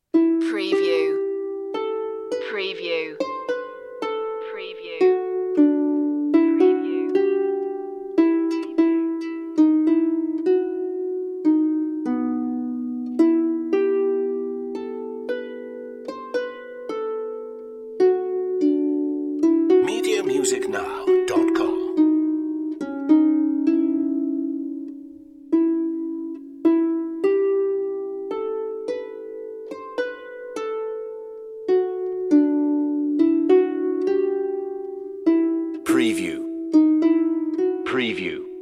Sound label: greensleeves harp